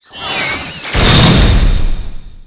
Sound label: Skill Gunner Break Shot